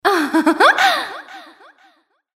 moan; Imperial Girls

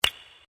menu click; One Shot Fishing

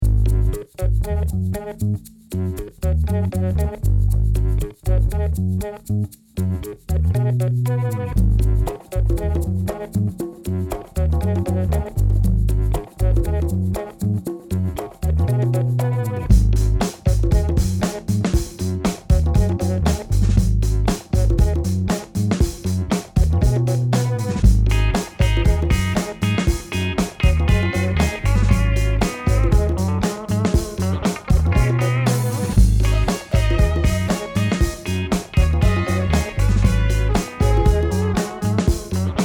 BGM; Seven Seven Seven

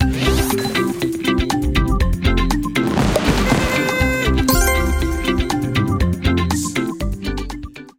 bigwin amb sfx; Hot Party Deluxe; Wazdan

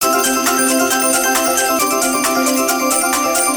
count up, slot; slot game; Hot 40

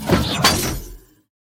BOSS deform; Thunder Fighter

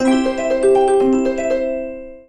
collect freeround; slot game; Grand Casanova

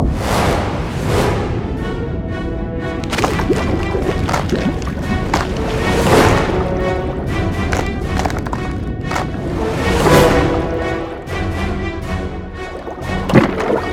super big win; Hungry Shark; Wazdan

